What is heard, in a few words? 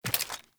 Steps